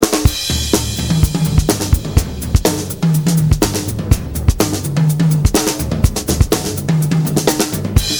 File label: alt rock loop, DrumLoops